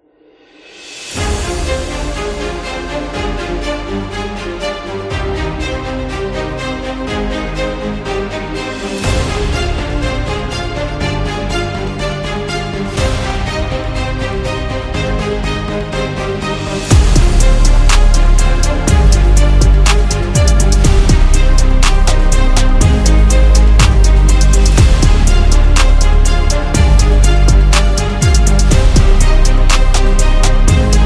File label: Dramatic Beat